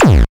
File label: kick; foxdot default